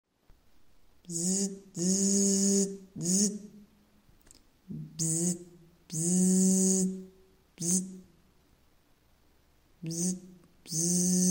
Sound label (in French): son abeille morse